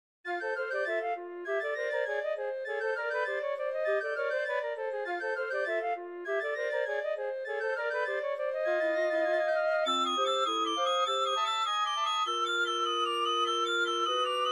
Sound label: wind adventure, woodwind